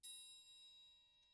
triangle hit p; Idiophones; Struck Idiophones; Triangles

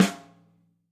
Snare Soft, hydrogen drum samples

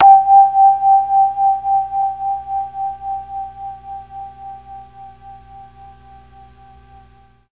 G3 vibes, mellotron MKII

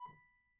Grand Piano, Kawai, rel B4; Chordophones; Releases

